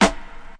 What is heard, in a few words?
crap sd; single hits